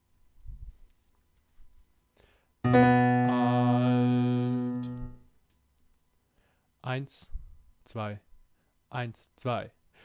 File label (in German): Alt mit Tutti Klavier; Thuma Mina